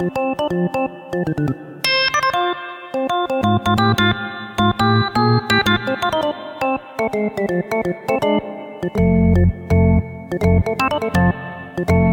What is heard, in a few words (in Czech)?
Jazzové varhanní preludium